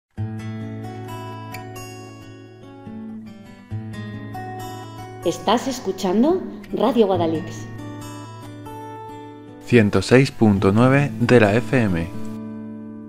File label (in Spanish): Cuña tranquila